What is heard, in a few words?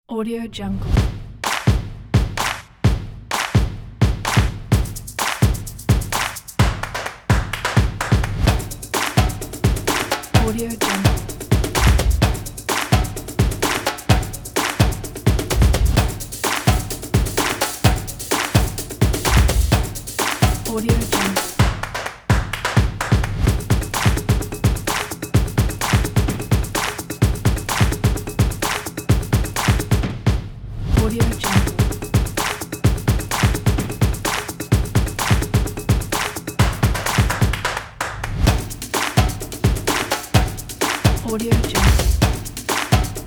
a stomp